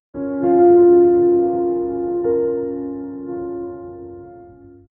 calm cinematic ambient mu, psychological

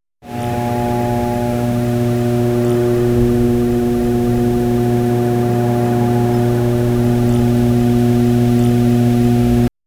low basement hum bulb buz

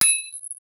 metal barrel bullet hit; short decay

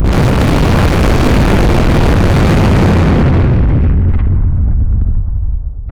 disasters

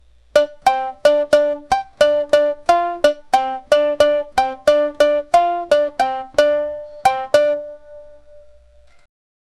warm rustic steady rhythm, banjo

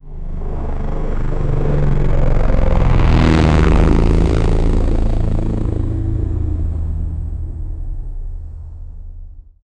helicopter

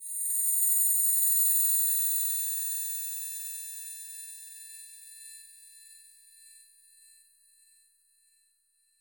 short bright sparkle soun

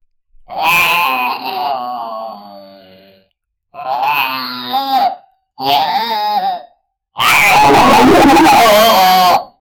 angry monkey

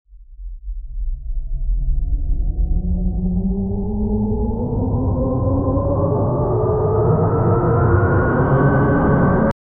ambient sound for rising; cybernetic

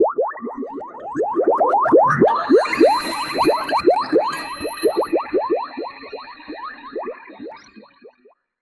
a cluster of shimmering, bubbles

